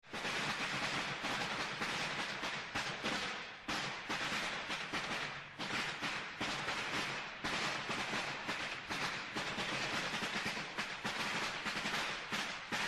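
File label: BianPao, firecrackers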